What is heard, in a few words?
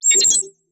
futuristic cyberpunk button hover